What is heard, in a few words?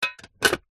foley metal money tin lid place on; zapsplat